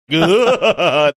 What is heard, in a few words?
making ghoul sounds